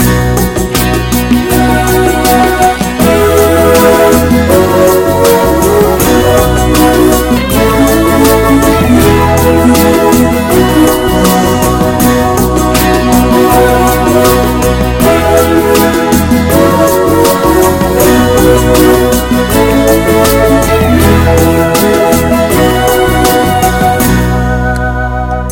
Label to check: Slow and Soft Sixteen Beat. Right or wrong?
Right